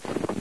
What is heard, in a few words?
snow, footsteps; Source Engine; cstrike